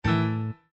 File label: Windows Battery Low; abbottabad compound